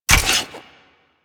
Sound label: Staff Equip B; Warframe